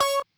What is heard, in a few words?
Race Count Single Full; Timberman VS; ps4